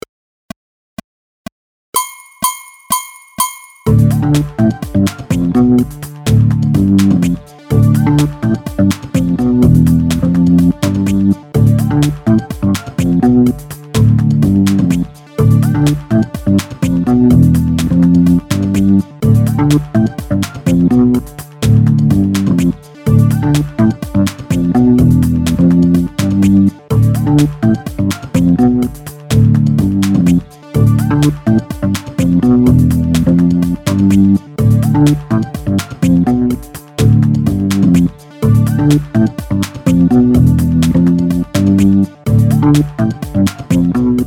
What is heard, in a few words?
FILL 5 NOTES FLAT